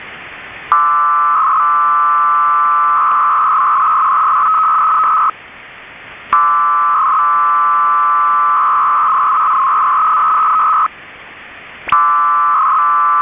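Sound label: BELL 103 SHORT, Algerian Air Force FSK modem; Station sending several short bursts with 300Bd; FSK-systems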